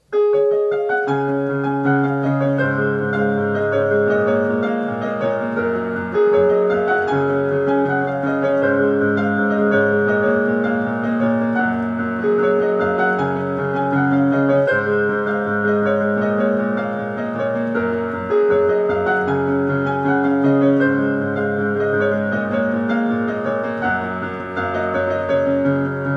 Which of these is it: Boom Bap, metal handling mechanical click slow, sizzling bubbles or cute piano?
cute piano